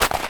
frosted snow place; everness

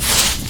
sand dug; everness

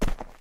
dirt hit; everness

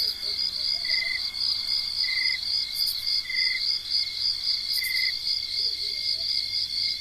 ambience night crickets; everness

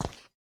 ceramic footstep; everness

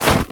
snow footstep; everness

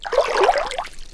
water footstep; everness

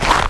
frosted snow hit; everness

